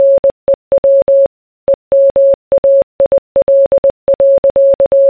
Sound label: New Email